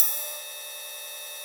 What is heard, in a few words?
Ride Ping